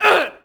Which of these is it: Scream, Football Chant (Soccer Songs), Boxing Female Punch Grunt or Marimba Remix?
Boxing Female Punch Grunt